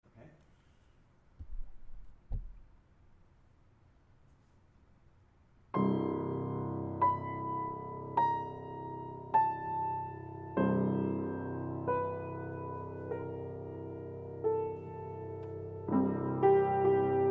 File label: prologue piano